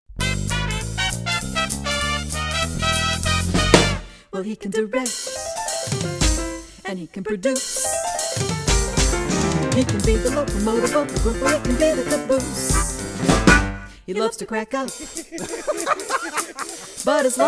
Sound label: singing business card